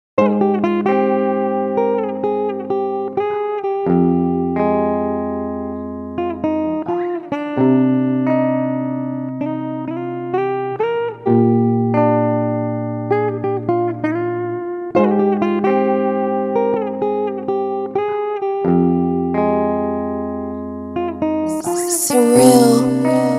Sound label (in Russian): DNB